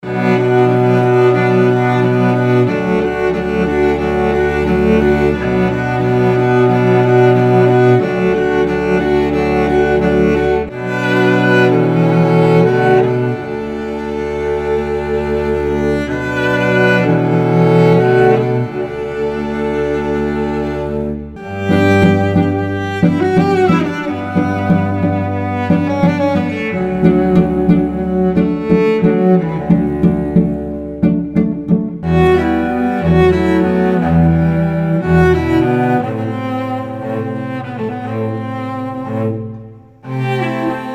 Cello Moods

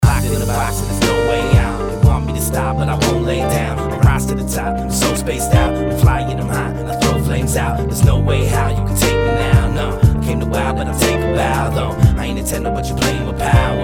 Mad Hip Hop Vocals

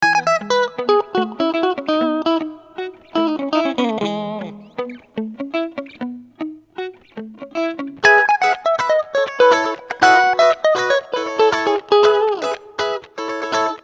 African Guitars; African Guitars Vol 1; African Guitars Vol 1 features the crisp, upbeat tones of African style electric guitars reminiscent of Mbira and Soukous styles.

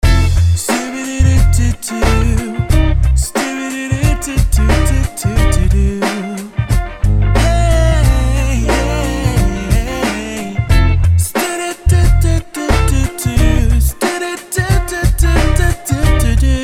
Smooth Vocal Phrases

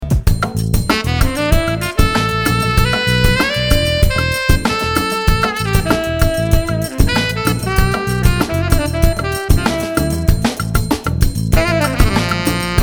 Chilled Sax

